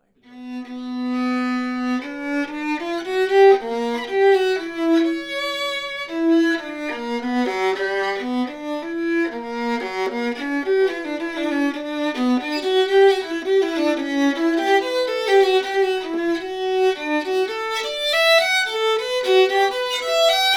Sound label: Bach No Bass bar